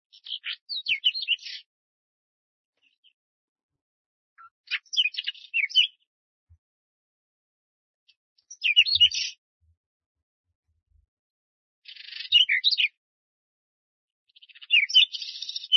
lugovoy chekan golos

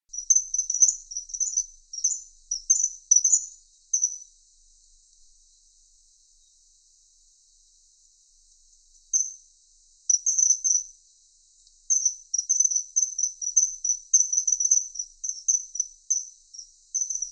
obyknovennyy zimorodok golos

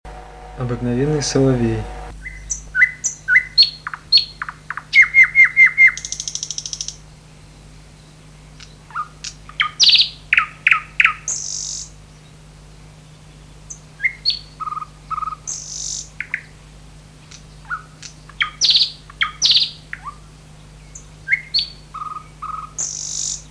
obyknovennyy solovey golos

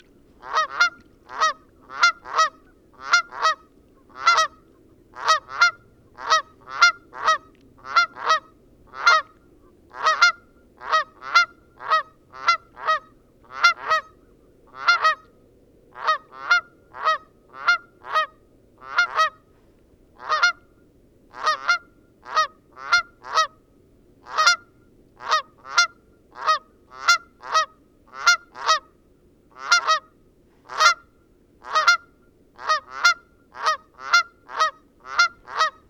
kanadskaya kazarka golos

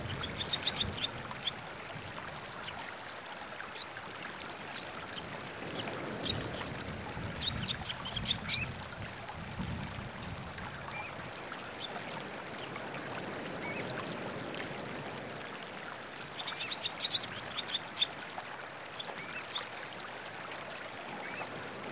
morskoy pesochnik golos

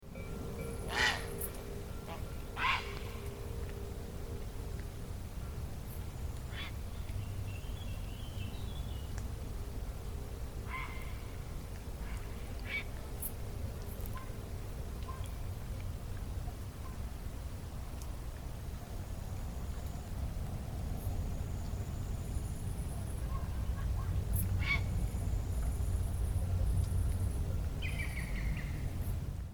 seraya caplya golos

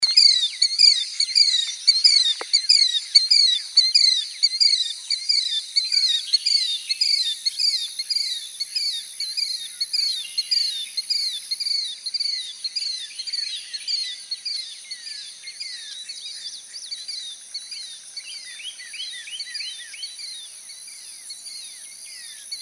zuek dvugalstuchnyy golos

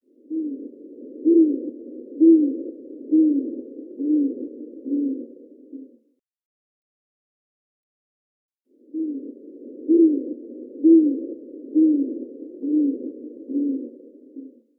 borodataya neyasyt golos

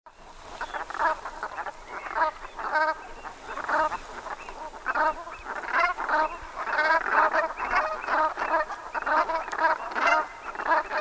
chernaya kazarka golos

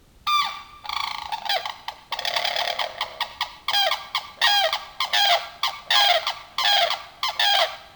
daurskiy zhuravl golos

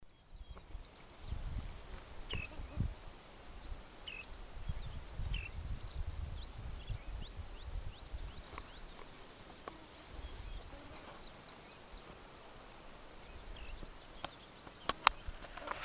dlinnopalyy pesochnik golos

